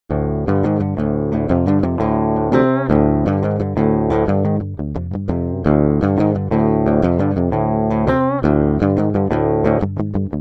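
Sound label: electric guitar drop D riff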